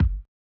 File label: kicks; Uppercut; RoBKTA Sample Pack Supreme